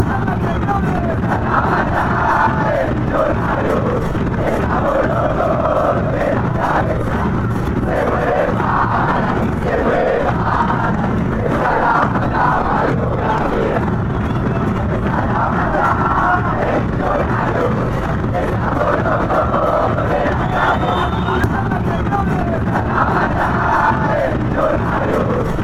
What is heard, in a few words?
band, fan chants; mfc